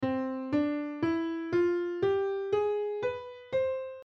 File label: c major harmonic scale